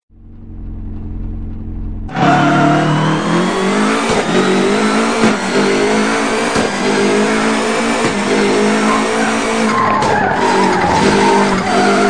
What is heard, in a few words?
Car Sounds Subaru Impreza Prodrive